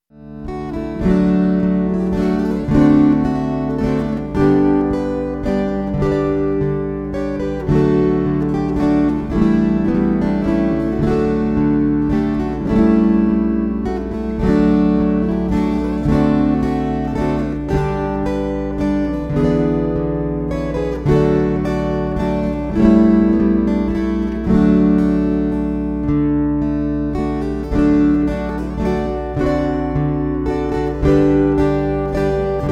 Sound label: Waltzes